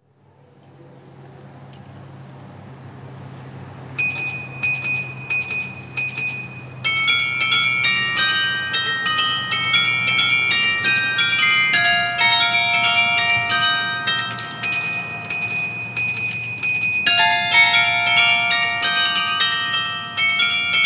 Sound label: carillon